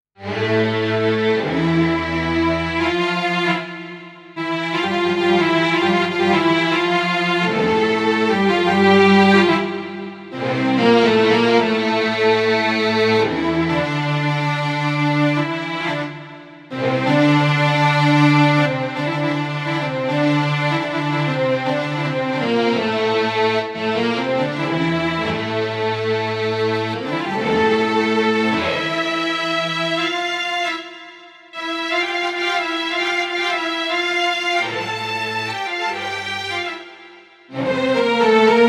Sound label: Orch Soft; Pa2x